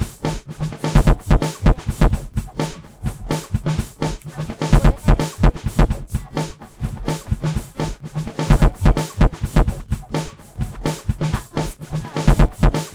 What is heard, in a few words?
celebrate percussive